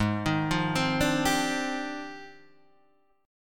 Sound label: F Minor 13th, G sharp